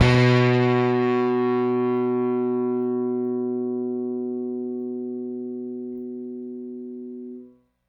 Rickenbacker Bass; Airwindows

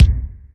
cave kick; Greytsounds; Scott Peers Sound Engineering

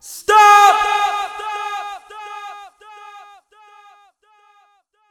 STOP VOX -R, Funky Vocals, SHOUTS; USB Soundscan vol.18

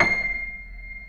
Keyboards Acoustic, C6; USB Soundscan vol.17; AKAI

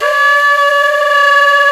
Flute, Singin' Flute

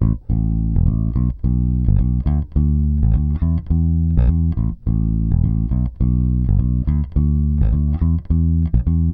Pop, Bass

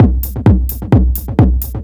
DRM, LOOPS 130, House

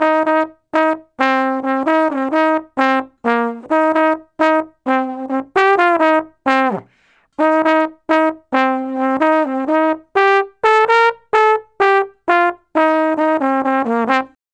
Trombone Solo; Sonic Foundry (Sony Creative Software); Jazz Solos and Sections

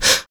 FEM PERC, Vocal Jazz FX

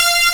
Impact Boss loop; Ganbare Goemon 2 - Kiteretsu Shogun Magginesu!

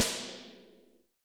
DWSNCNRFSR, drums; Best Service; Real Mega Drums VOL-1

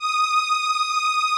VP-330, String Machines

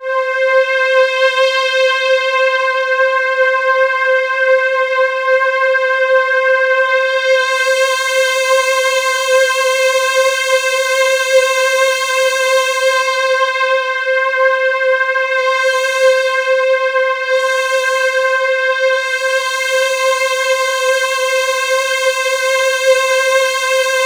C4, Roland JV 2080, JUNGLE BRASS

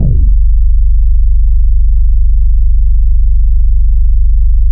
DROOPY, Bass Analog & Synth; USB Soundscan vol.12; AKAI

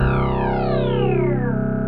SYN Morp C3; Best Service Gigapack 1

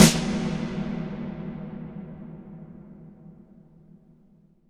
IMP SNR DRUM, Drum, Cinematic Impact

